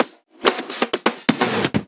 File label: Fills 128BPM, Clubsounds